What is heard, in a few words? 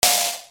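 percussion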